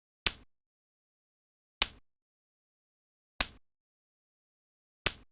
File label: coin toss